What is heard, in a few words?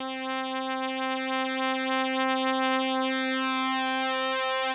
DD SYN, Disco House